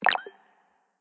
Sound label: Scribble Change; blue archive